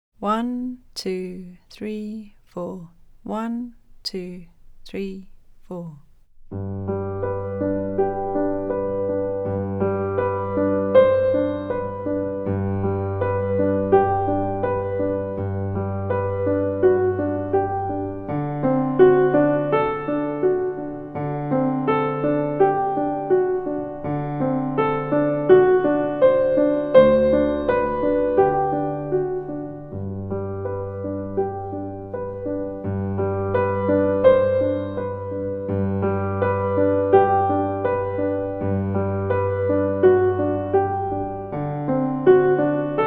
Tuneful Octaves

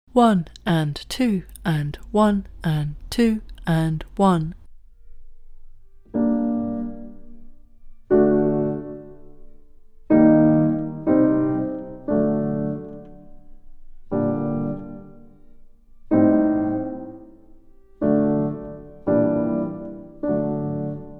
Andantino in Modo di Canzona backing

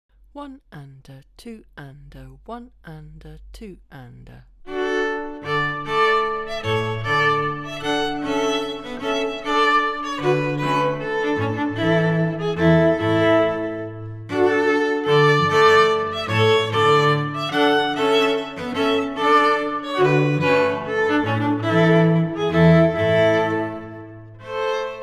Canaries backing

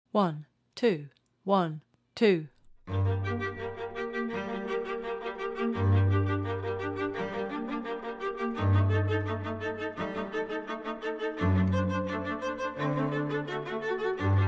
backing; Mozart 40

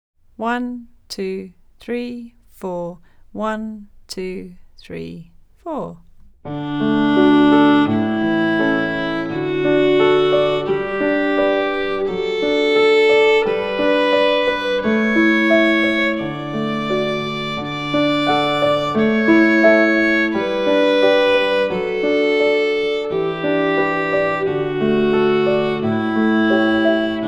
D major scale, violin

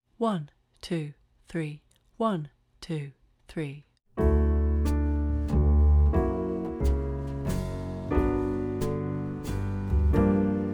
backing

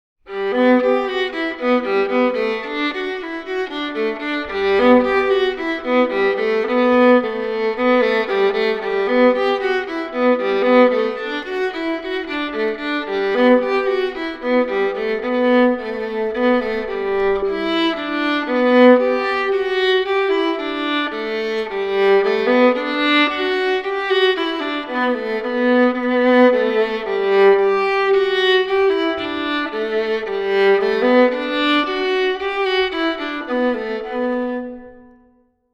Off to Sligo backing

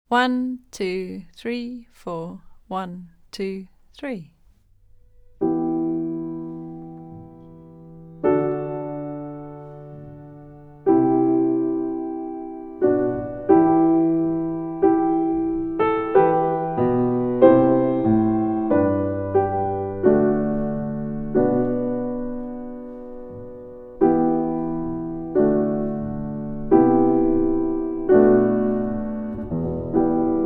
The Turtle Dove backing

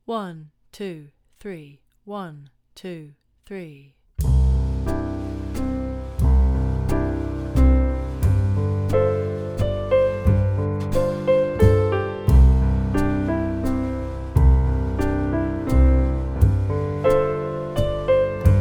Strumming Tune backing

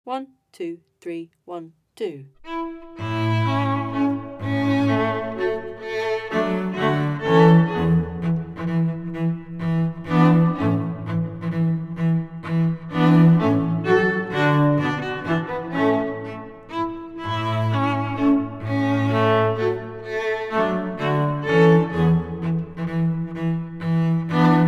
Menuetto backing; Mozart's Symphony no. 36

